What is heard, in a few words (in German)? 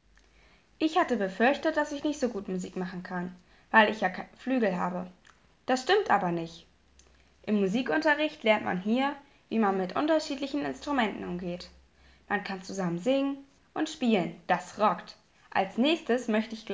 musik